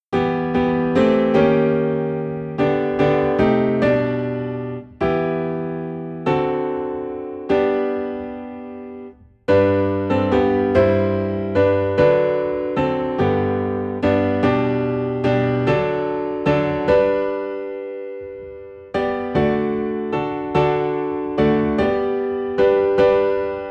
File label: Piano in G; Jesus Is All the World to Me